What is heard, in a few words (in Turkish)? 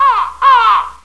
karga, hayvanlar